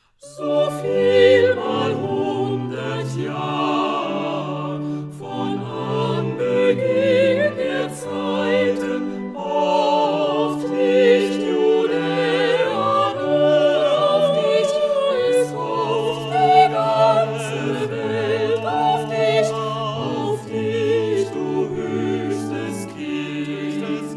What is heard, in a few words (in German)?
Du höchstes Kind deutsch